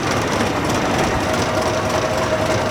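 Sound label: Plane P40 Interior Idle Loop; Airplanes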